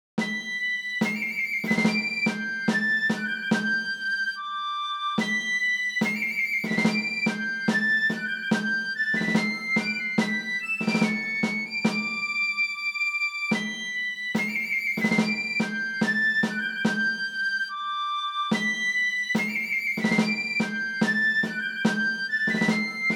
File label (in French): marche mousquetaires